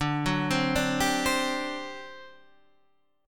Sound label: D 11th D, down, Guitar, Standard; D11 arpeggiated; JGuitar